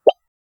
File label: slow eye blink sound; quiet